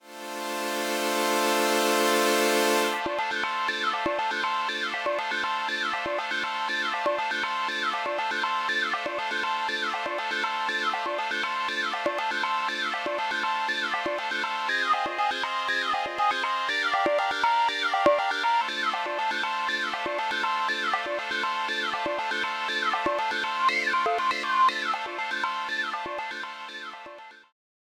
Rossum Electro Music Morpheus Cable Ring Filter Play